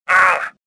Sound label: pain; Star Wars Jedi Knight II Jedi Outcast; XBOX; stofficer